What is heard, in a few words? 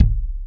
BS CHPSTK, Chapman Stick